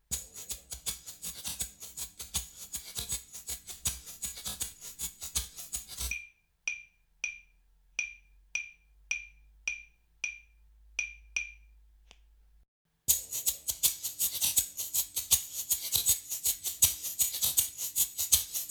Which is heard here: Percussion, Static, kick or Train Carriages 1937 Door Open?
Percussion